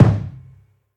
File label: long tail reverb kick drum g sharp key, steel kick drum